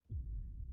boom silent boof

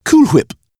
whip

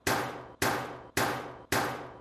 trash can

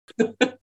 huhuh

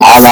bass boosted

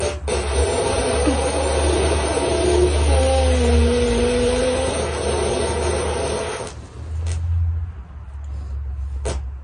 horse fart

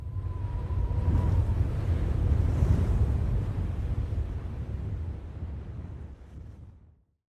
heavy wind

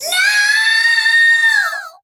upbeat girl scream; soul calibur v

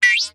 boink

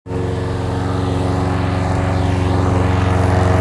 grass saw

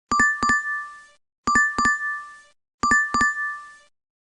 ring; doordash

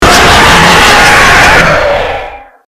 jumpscare; one week at bcs

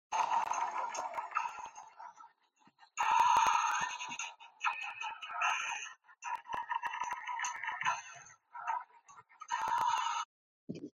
scary TV

